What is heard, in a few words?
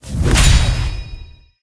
multiplier add; willyshotchillies